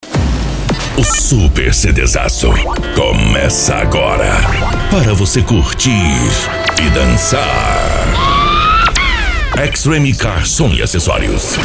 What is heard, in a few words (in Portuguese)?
especial piseiro e sertanejo